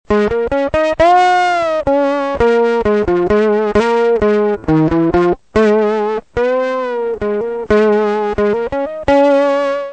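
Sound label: Green Ringer, guitar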